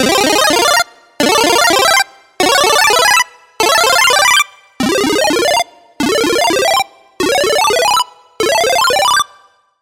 SFX Mushroom Up; Routed Sound; Vital Expansion - Hyper Gamer Vol.1

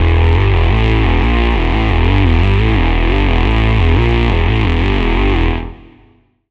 Lead, Serum 2; Routed Sound; Serum 2 Expansion - Quantum Enforcer Vol.1

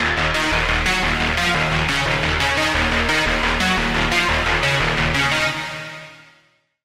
Lead, Serum 2; Lead - Phoenix Hill; Routed Sound; Serum 2 Expansion - Flame Summoner Vol.1

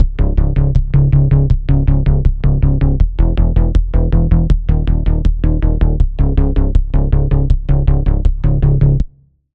Pulse, Serum 2; Pulse - Things; Routed Sound; Serum 2 Expansion - Quantum Enforcer Vol.1

